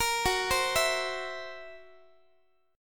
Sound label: Fsharp 7th Flat 5th down Guitar; F#7b5 arpeggiated